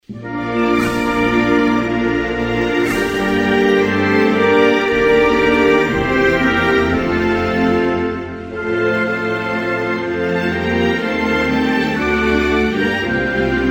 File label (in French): Suede, Hymnes nationaux